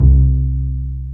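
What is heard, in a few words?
STR Cb Pizzicato, strings; Cb Pizz 1; Roland L-CD702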